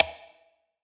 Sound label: big space perc; ETHNIC and WORLD PERCUSSION